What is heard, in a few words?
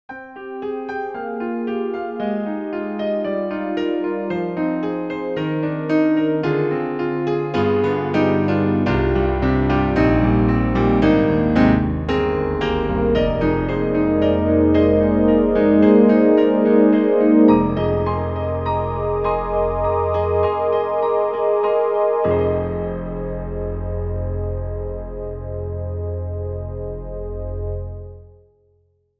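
Acoustic Piano+Pad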